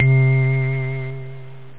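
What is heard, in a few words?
clavinet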